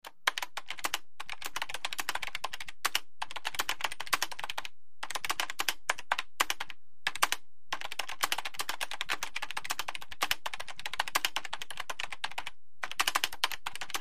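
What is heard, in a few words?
Mac Keyboard Type Steady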